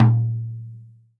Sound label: Pearl 12 Tom; BLACK PEARL